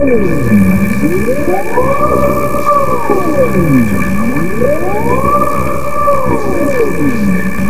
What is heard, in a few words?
bad dream, vox FX; Big Beat Samples & More; AKAI; VOX FX1 KIT